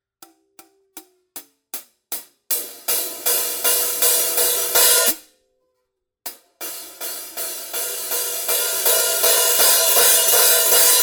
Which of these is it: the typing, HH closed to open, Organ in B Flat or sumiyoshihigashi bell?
HH closed to open